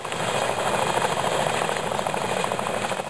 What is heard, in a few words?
helis